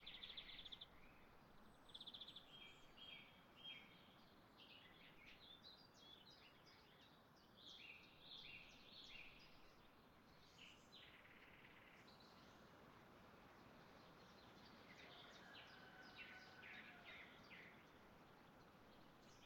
AMB Vertia; BLIND QUEST - The Enchanted Castle

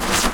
Artigli

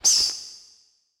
Topi Hit

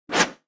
Tridente

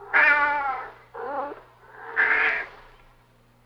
gatto; Inquisitor 3

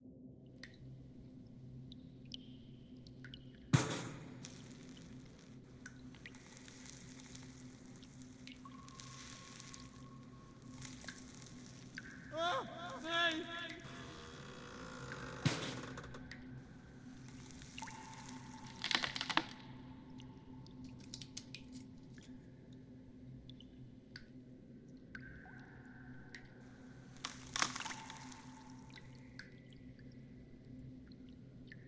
AMB Prigione; BLIND QUEST - The Enchanted Castle

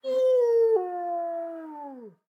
Lupo alfa; BLIND QUEST - The Enchanted Castle

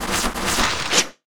Artigli Morso Pungiglione

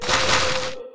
Morso Prigioniera

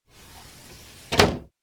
finestra Open Close; Inquisitor 3

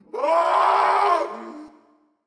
Grido Tortura

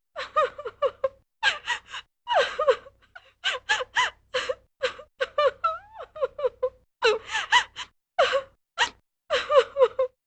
Pianto donna; Inquisitor 2

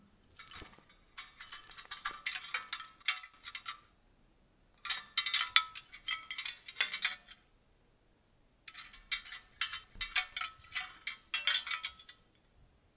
rumori catene; Inquisitor 3

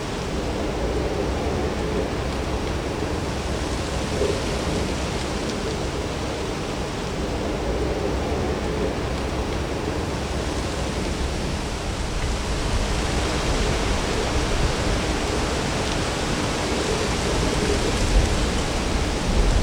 vento tra alberi; Inquisitor 3

